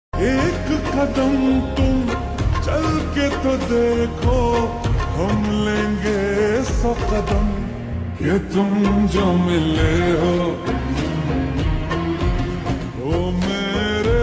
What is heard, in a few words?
Slow Reverb Version Ringtone